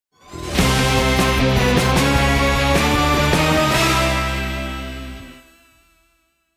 Transition

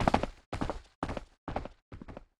Walk Go Two; D4DJ Groovy Mix; Android, iOS, Mobile

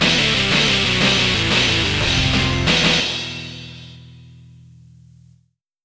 Game Over; Cyberlip; Neo Geo CD

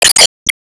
Enemy Metal Damage hit

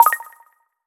Goal

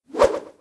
Shielded Shield Up; Troll Shielded SFX Shield Up 01

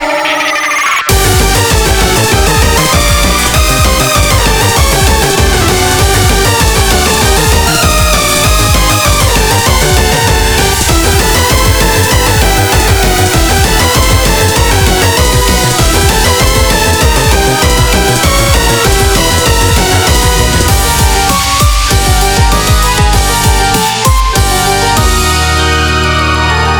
BGM Reach; Mahjong Fight Girl (Arcade) (gamerip) (2023)

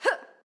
Swipe Normal; Jackie

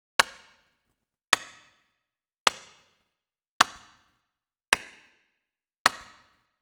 Clap alone

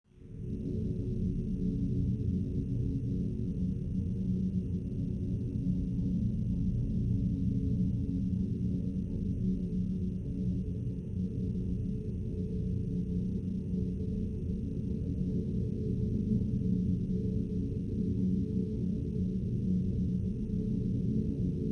Amb Nothing

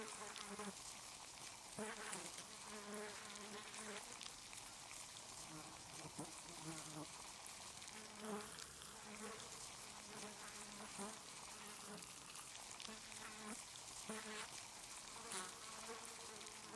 Flies Buzzing And Maggots; Lethal Company

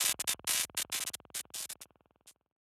Electricity Status Effect One Shot; Going Under

